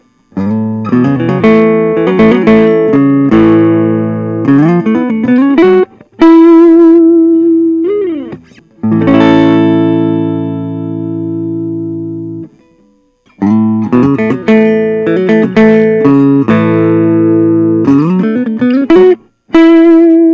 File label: gibson es 175d; 1974; natural